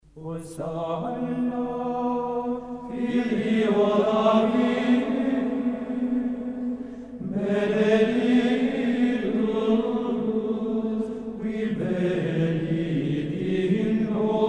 antiphon in mode 7, Chant, Benedictine Monks; Hosanna filio David; Santo Domingo de Silos

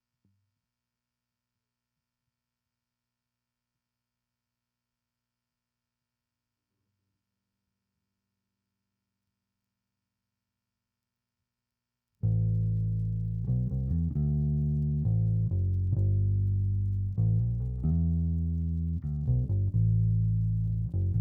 bass

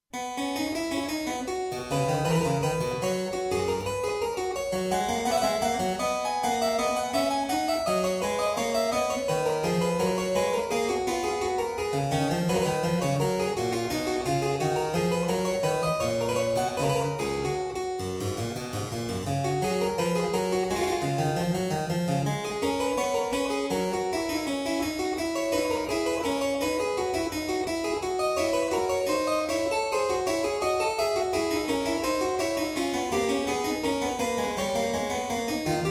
Invention in C for Harpsichord; Bach

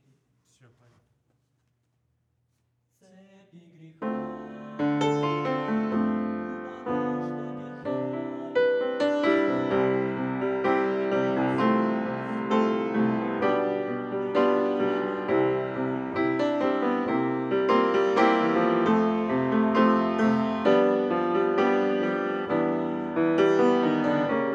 piano high

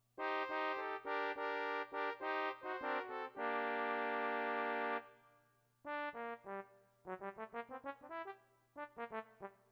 synth

